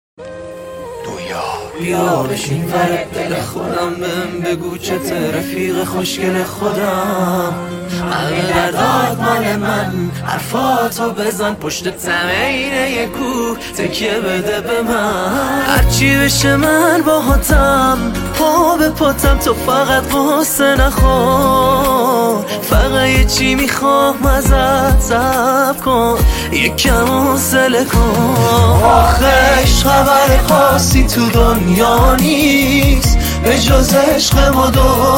music hamkhani gorohi

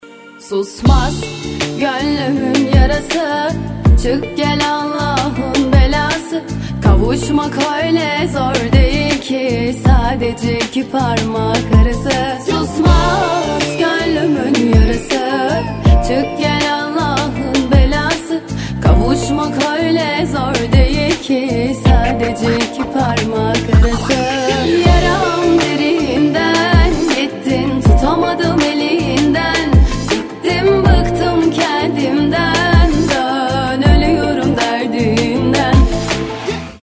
music zang torki

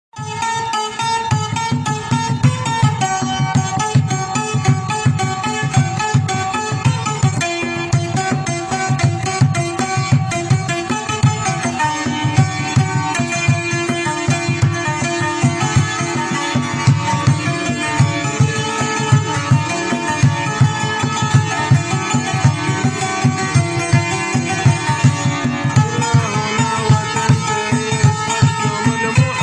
santour arabi

